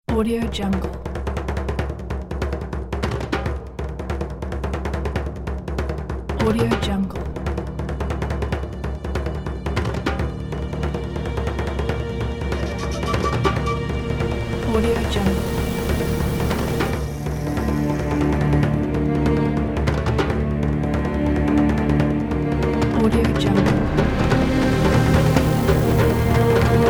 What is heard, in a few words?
tribal tension